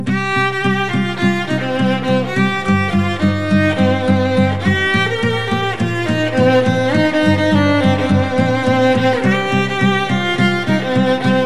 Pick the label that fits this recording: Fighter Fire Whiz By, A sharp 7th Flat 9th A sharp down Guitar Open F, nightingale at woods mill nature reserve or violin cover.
violin cover